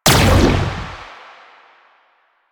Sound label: Gunbrella Shot Laser, Weapons; Warframe; Tenno; Koumei